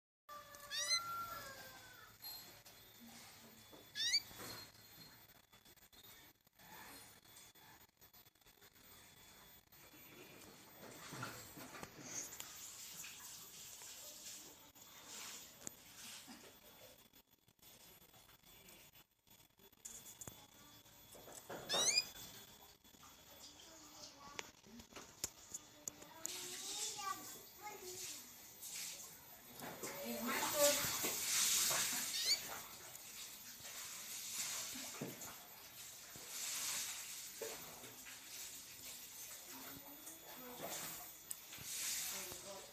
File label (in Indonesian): kenari belgia; Suara Kenari Belgia 01; Burung Kicau